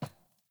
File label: Boot Walk Metal, Footsteps; Characters; Enemy; Melee Grunt; Boots; Sinecure